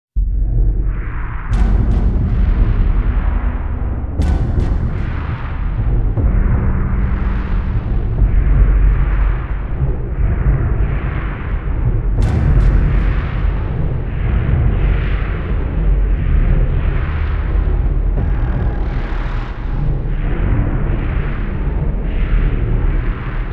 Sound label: Sequential Prophet X; Gates Of Hell